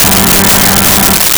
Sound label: Pipe Organ G3; Nintendo; July 2020 Gigaleak